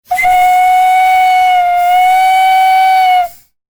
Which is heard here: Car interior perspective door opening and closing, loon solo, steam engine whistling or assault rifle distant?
steam engine whistling